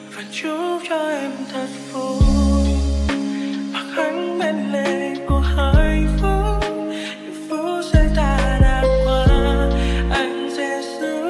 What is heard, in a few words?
Lofi